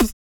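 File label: vox percussion, Vocal Planet Jazz FX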